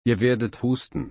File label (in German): husten